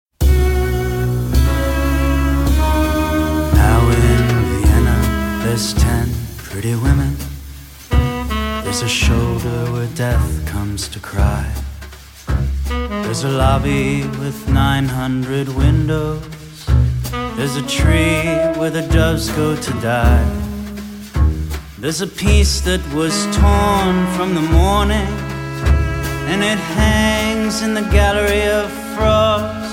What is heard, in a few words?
waltz